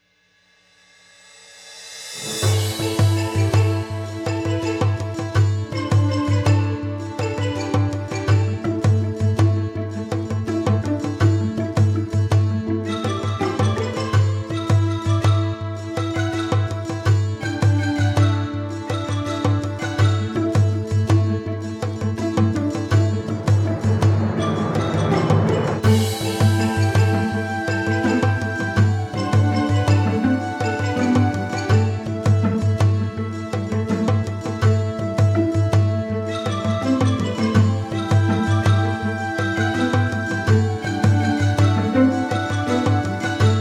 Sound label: 82 bpm